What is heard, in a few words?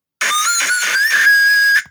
loud; mcdonalds